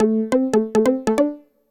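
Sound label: synth electro 140 A; Techno; Keys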